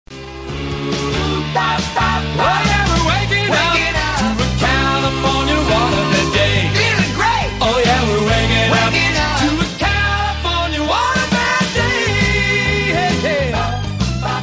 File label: Jingles